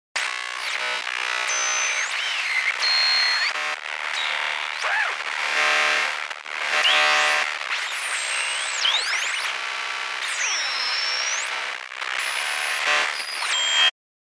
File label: noise